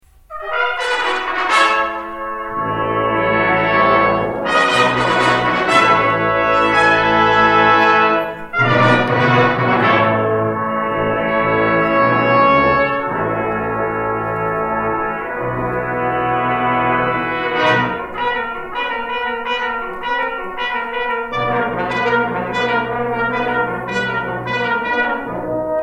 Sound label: Fanfare and Presto, Symphonic Brass Ensemble